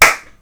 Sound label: clap; abstract; Free Sound Assets